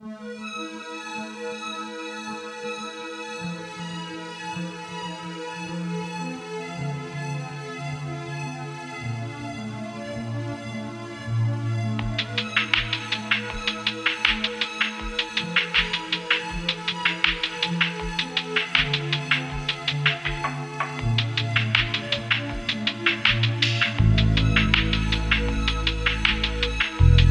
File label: chill vibrations, 432 Hz; kobido cigal chill